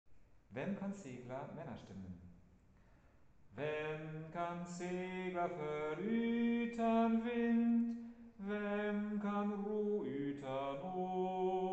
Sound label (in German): Maennerstimmen